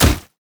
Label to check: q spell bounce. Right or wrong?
Right